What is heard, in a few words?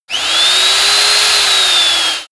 sander free short